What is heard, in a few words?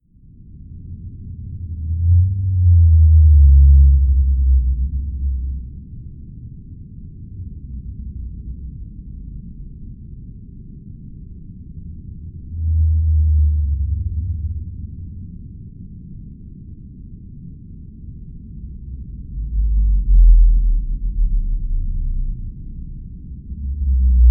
blue whale fm calls; brian miller australian antarctic division